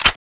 desert eagle slide push

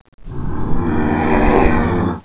gar idle; schall; garg

